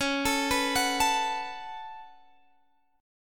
C sharp 7th Suspended 4th Sharp 5th C sharp, Guitar Drop D